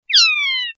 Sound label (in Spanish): queja tion, pardillo